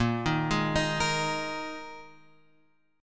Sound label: A sharp 7th Suspended 4th A sharp, Guitar, Open F; A#7sus4; arpeggiated; x,5,3,3,6,5